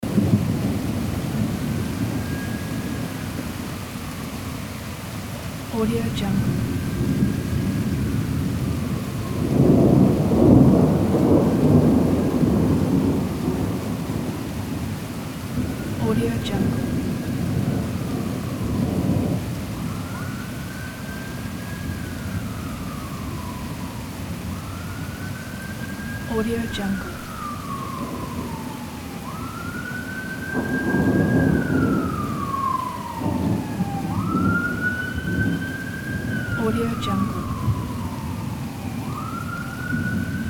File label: thunder rain and ambulance siren